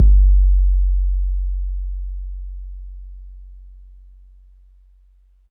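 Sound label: bass drum 808 MPC60 Smooth F#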